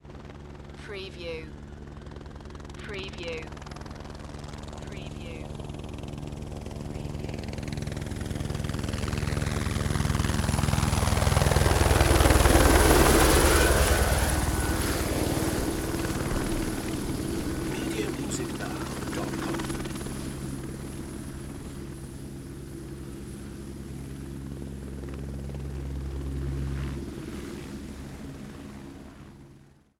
Helicopter Close Fly By

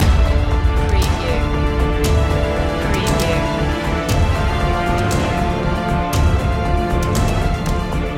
the showdown trailer loop

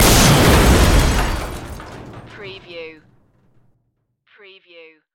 EXPLOSION MILITARY JEEP METAL

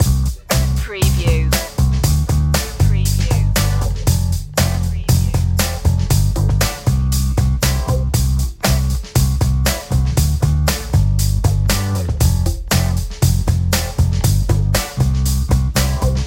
fresh groove loop

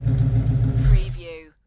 Mustang 68 Fastback Shut Off Exhaust Perspective

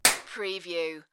Clap

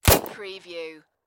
Submachine Gun Thompson

